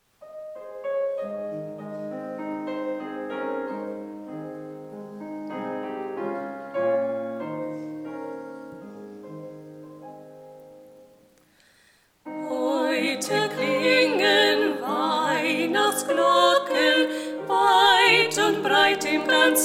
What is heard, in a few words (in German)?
Lied - Duett - Heute klingen Weihnachtsglocken; Predigten